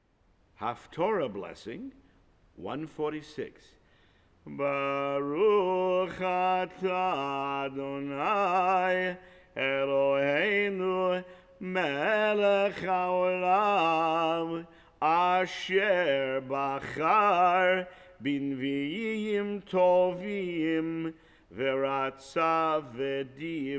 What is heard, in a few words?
haftarah blessing before; Sim Shalom